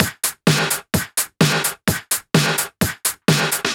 House Beat, 128 BPM

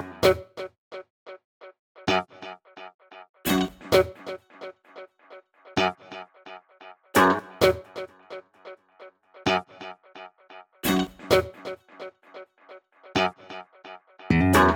Special Sounds Root F# 130 BPM; Vengeance Samples; VEH3 Special Sounds

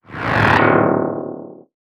FX Scratching; Vengeance Samples

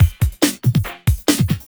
Loops BB 140, BreakBeats; Vengeance Samples

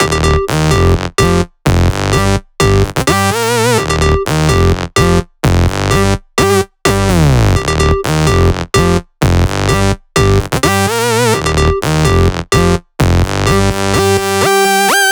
127BPM Melody; Vengeance Samples; Com Pop Kit

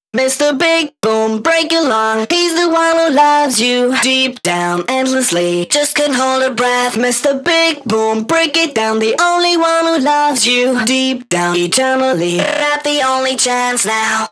Pitched Vocals DRY, 140 BPM; Vengeance Samples; Vocal-Hook Kits; Song Kit; Mr Big Boom

